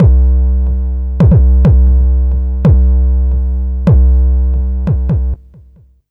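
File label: SCUM bass drum, drum loop; Big Fish Audio; Uncivilised G'rooves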